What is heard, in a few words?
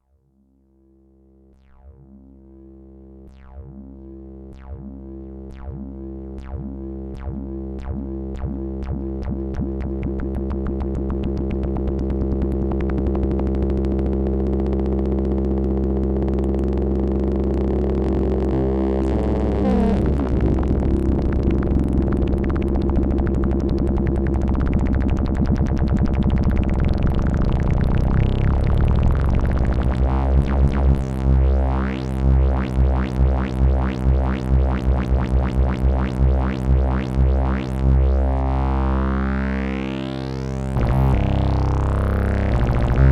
Loop EG; GRP A4